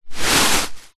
household beanbag small sit on

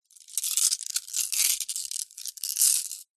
gemstones handling; zapsplat

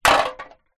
drink cans x2 empty down on concrete ground; foley

